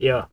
"YOH", shout; AKAI S6000 CD-ROM - Volume 6; Human

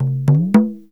INDIAN DR F#6; Spectrasonics; Heart of Asia; INDIAN SET

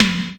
Misc Tom; Mo' Phatt; Digital Sound Factory Mo' Phatt

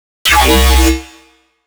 fluctuate, bass

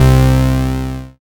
BAS MKS-50, Roland

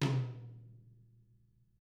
DW 10 hit; Drums; ILIO; Double Platinum Drums 1

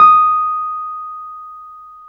PNO, Wurly

